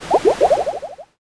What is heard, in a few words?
poison